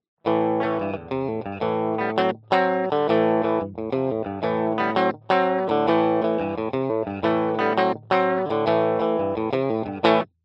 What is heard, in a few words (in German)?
di fender 60er telecaster rockt